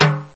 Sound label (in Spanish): timbales